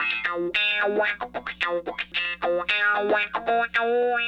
Raw Funk Guitar

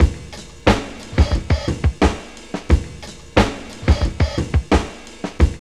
SYNCOPATED 89, Funky Elements loops; Zero-G

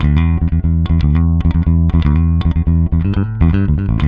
throb E, 120, bass; Spectrasonics; Bass Legends

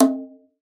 Conga, Session Instruments

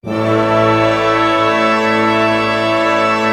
orchestra chord cluster pentatonic; Roland LCDP08 Symphony Orchestra

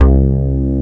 ANAL BAS C2, Bass Analog & Synth; USB Soundscan vol.12; AKAI; VINTAGE

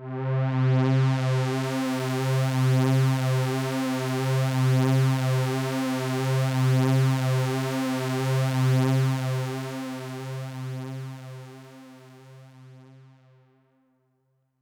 Pad C, Synth Prophet One Shots; Electronisounds

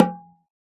oxaca, percussion; ILIO; Synclavier Percussion 2; World & Orchestral

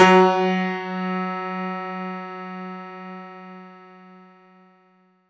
F#3, synth; 90 s Sample CDs; NiceBeats; SynthLine VOL-23

